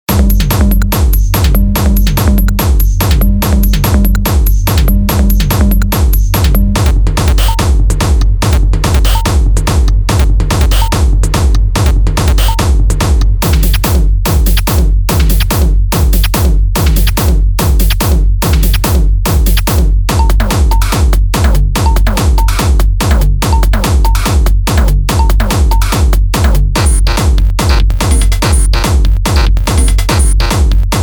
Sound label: hard style loops; gigaloops